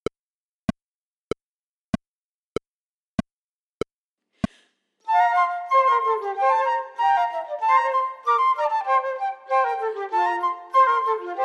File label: Colonial Country Dance minus 2nd part